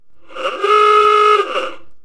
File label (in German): hupe; waltons